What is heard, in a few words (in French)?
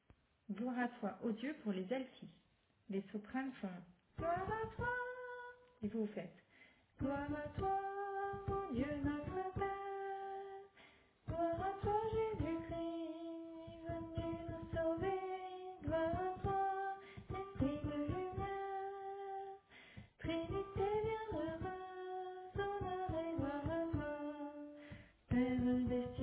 Alto